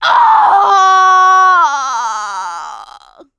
death scream; producer

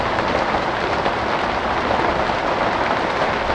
interior rain med loop; rainscapes

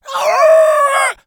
howl, infected; npc, alert, becomealert

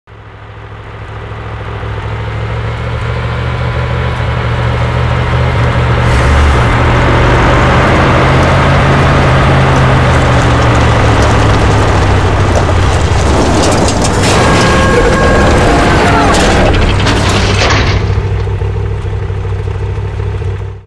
apc enter

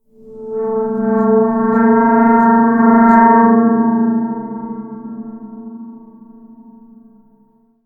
rand bridge groan; random amb sounds

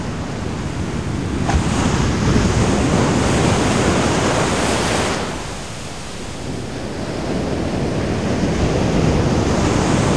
shoreline waves loop

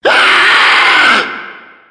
hunter warn; voice

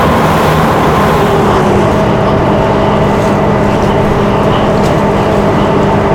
pontoon stopped water loop, airboat; vehicles

